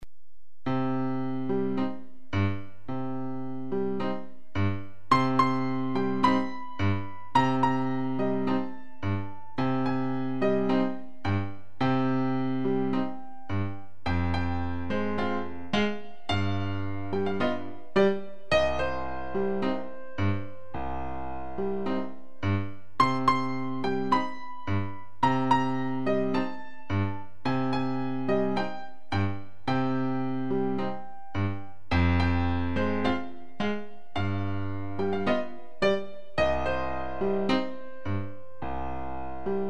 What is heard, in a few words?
Tango Habanera 108; Seiber; Rumba Bis Walzer